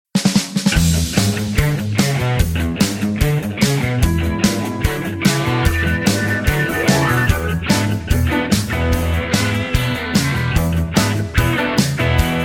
E No Backing Vocals, backing tracks

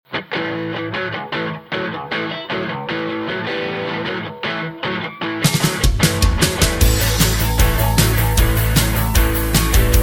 A No Backing Vocals, backing tracks

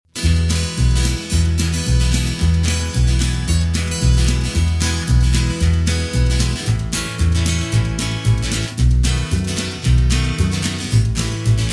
(E) No Backing Vocals

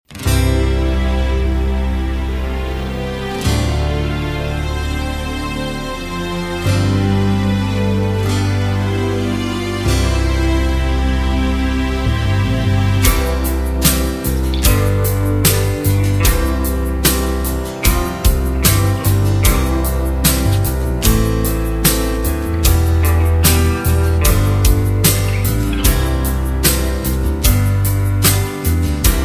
No Backing Vocals, (C)

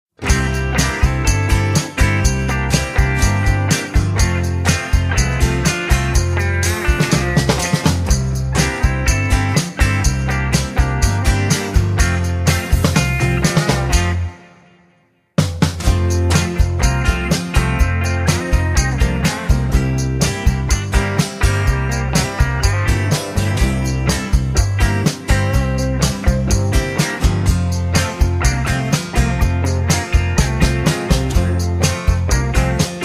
(F) No Backing Vocals

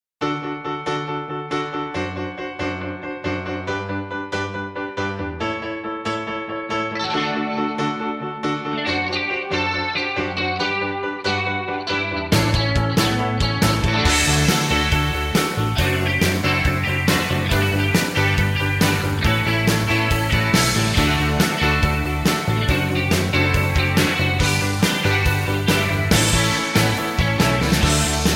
D No Backing Vocals, backing tracks

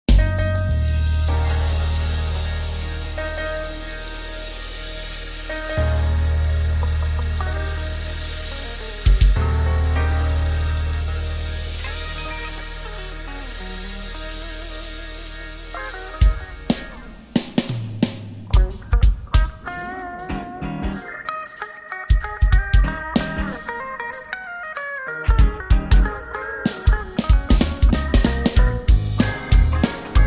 Eb No Backing Vocals, backing tracks

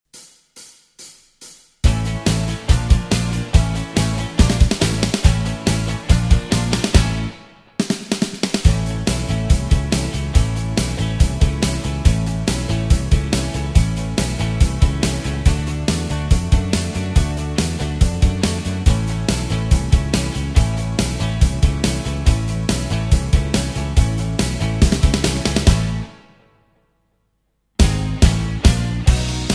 A No Lead Guitar, backing tracks

